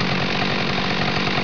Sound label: chainsaw idle